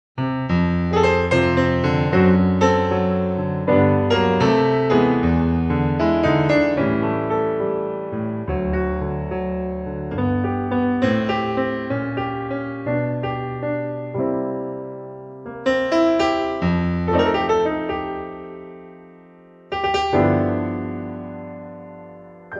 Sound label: Vintage Steinway D, piano